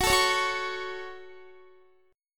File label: F sharp Minor F sharp, Guitar; F#m strummed; x,21,19,18,18,20